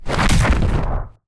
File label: kick heavy